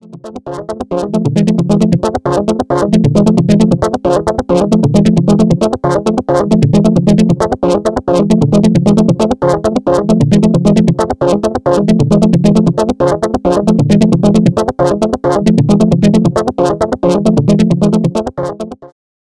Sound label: Multi LFO Evolution